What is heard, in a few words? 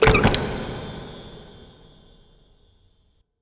Door Opening; helltaker